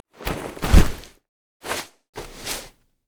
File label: recall wind d; LoL; annie anniepanda